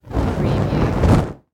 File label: Torch Movement